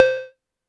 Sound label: polyvox perc, Polivoks; Formanta Radio Plant